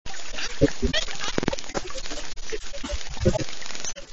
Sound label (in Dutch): gewone dolfijn; walvisstrandingen